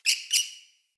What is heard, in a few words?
Messenger Rat Trapped Call D; Ambient; Vocals; Verminia; Animals